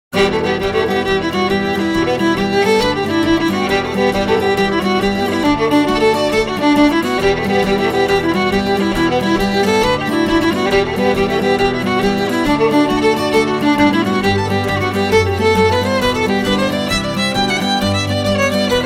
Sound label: Wedding Jig